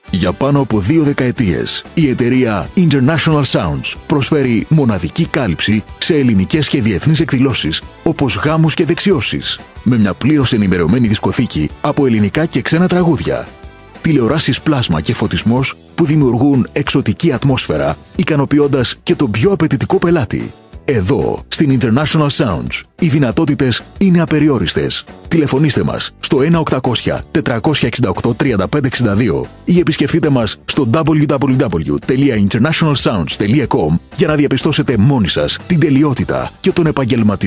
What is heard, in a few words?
DJ; International sounds DJ Entertainment